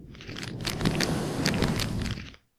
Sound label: Transition Woosh